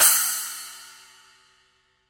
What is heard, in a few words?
dark energy splash mark i edge; signature dark energy 8